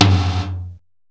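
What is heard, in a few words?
Tom, Roland S50 Percussion; Drum Samples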